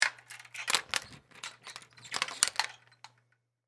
Door Lock Slide, Foley, Door, Lock, Slide, Chain, Lock; Hotel, Door; Blast Wave